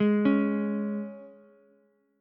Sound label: Db 5th Db down Ukulele; Db5 arpeggiated; JGuitar